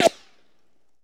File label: Rico & Whiz By Single; Sony Pictures Sound Effects Series; Ricochets - Hits - Whiz Bys